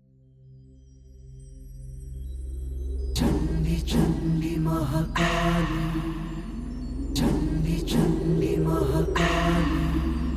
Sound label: Female